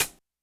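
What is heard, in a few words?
Hi-Hat Closed Tight; YAMAHA RM1X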